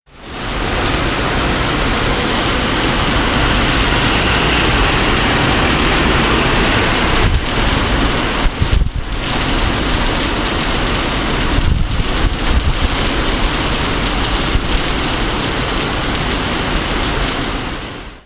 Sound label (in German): Regen, Singapur